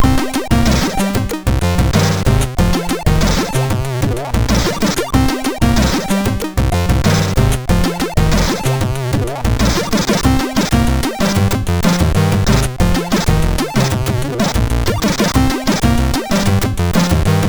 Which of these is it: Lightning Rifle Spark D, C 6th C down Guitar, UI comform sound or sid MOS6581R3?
sid MOS6581R3